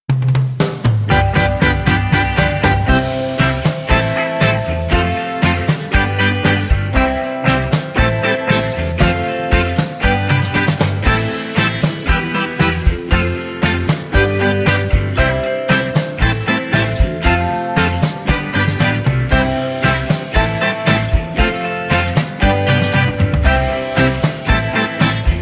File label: C To Db No Backing Vocals